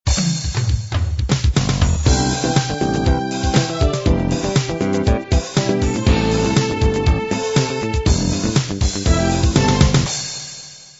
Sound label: disco miami intro